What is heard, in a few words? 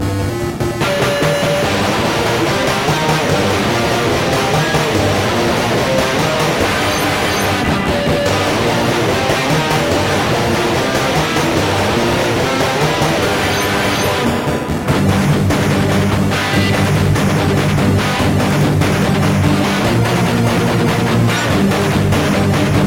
chipsurf song; weeklybeats-2012